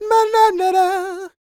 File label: SING, Vocal Planet R&B & Dance; ILIO